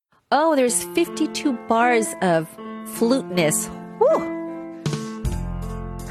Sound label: bars of fluteness